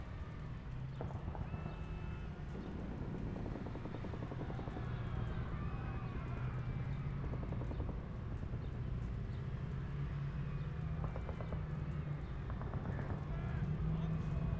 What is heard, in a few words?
town damage heavy; WAR